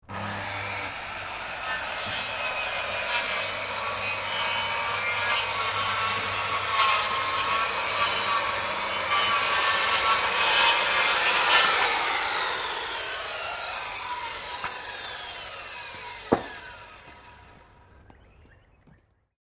Power Tool Circular Saw Makita Cutting Wood; Various power tools

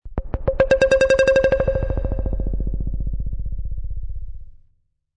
High stuttering beep sound.; Strange Tones; Sound Design

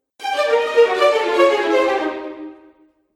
Fail Strings; Musical; Musical Sound Effects

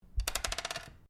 Dice Small Single Glass Table Throw; Variety of dice sounds; Casinos

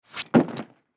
Duffle Drop Slow Slip Off Cement; Foley; Objects; Blastwave

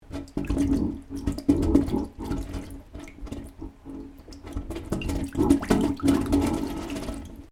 Water Gurgling In Pipe; Weather

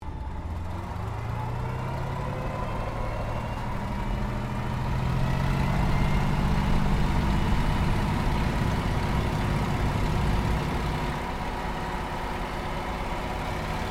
AIRCRAFT P-40 KITTYHAWK TAKEOFF INTERIOR; P-40 Kittyhawk / Warhawk / Tomahawk; Airplanes (Sound Effects:Vehicles)

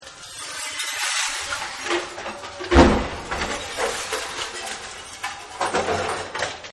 Slicing Through, glass; Sound Effects:Materials; Partners In Rhyme

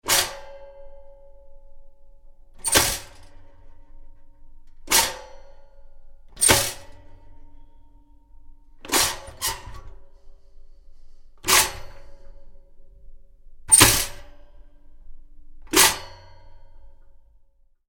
Toaster - Bread in toast out various; Miscellaneous Household Sound Effects

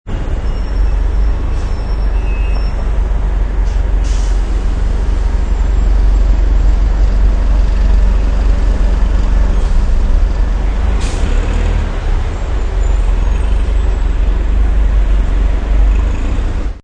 Traffic Jam, Traffic jam ambience; Traffic and Cars

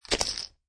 Poker Chip Piles Dropping On Playing Cards; Poker, gambling. Poker chip piles. Pile of poker chips dropping on playing cards; Card Sound Effects

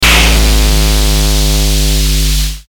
Hit Static Bass Envelope; Blastwave

